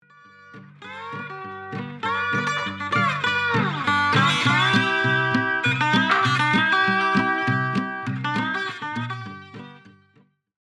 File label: Blues